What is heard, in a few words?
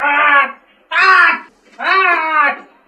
ah ah ah; Voice; ooh ahh